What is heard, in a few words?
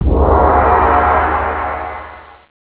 win; Ronin